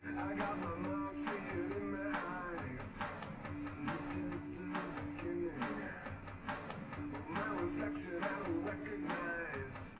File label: wdrums liverec; underdetermined; SiSEC11